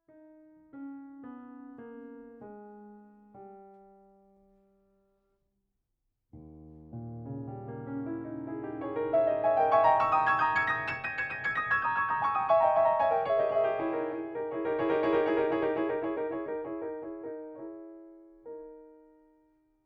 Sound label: pitch shift samples Time Pitch; iZotope RX10 Advanced; Radius best quality